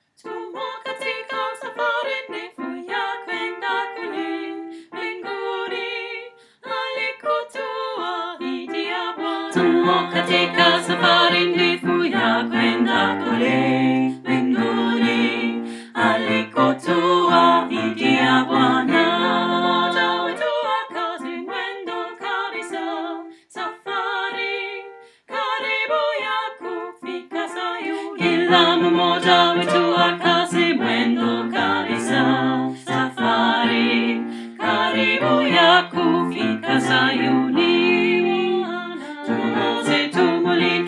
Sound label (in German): Bass